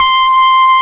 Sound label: GUITAR LEAD; WORS STATION; DANCE #3